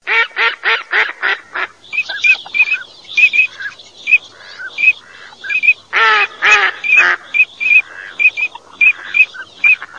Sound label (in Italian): GERMANO ALZAVOLA